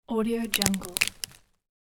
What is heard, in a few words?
stepping on branches